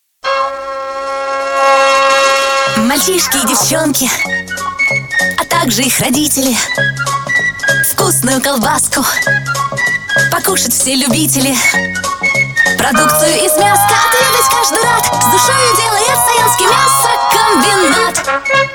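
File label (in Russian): vocalnii zhen, female vocal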